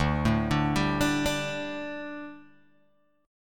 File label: D Suspended 4th D, Guitar, Open G